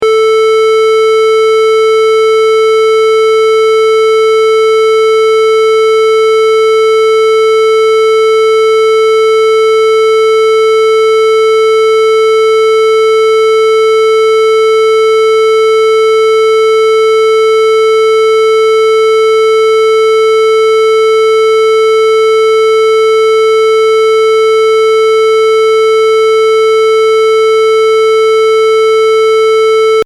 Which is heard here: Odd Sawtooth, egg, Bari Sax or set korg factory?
Odd Sawtooth